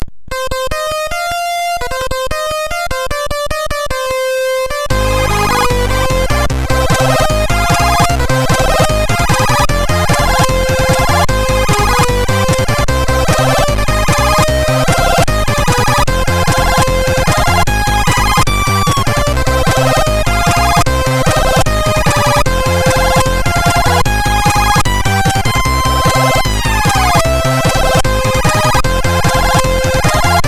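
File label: Chiptune, MOS6581R3; Dopeland Rythem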